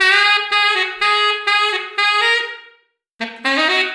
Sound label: Tenor Effect, sax; Effected; Sonic Foundry (Sony Creative Software); Jazz Solos and Sections